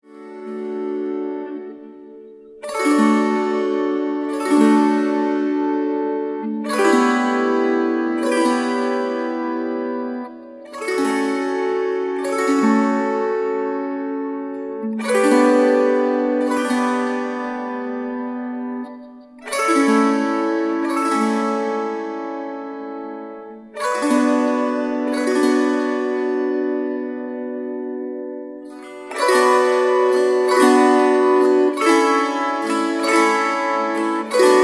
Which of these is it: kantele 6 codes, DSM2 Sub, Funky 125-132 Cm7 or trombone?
kantele 6 codes